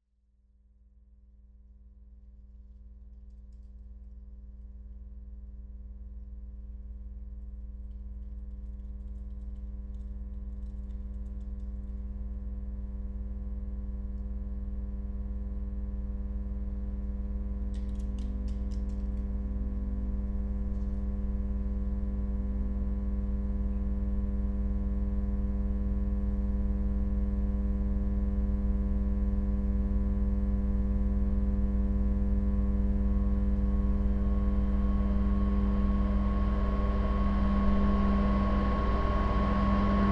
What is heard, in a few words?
Float Maschine Noise